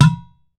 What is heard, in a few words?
UDU SLAP, African Perc; E-MU Formula 4000 Series Vol. 4; Earth Tones